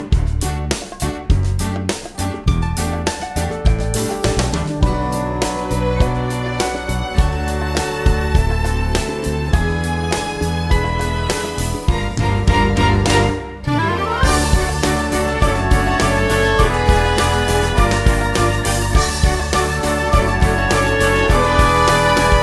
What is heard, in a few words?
without vocals